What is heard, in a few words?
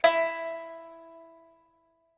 pipa